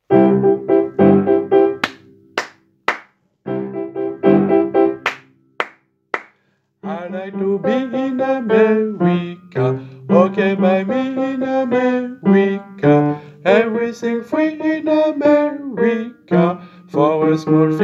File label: America basse